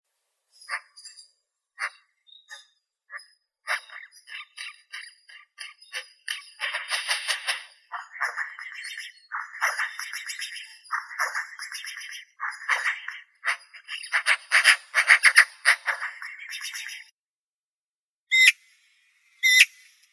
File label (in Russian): soroka pica pica, zvuki prirodi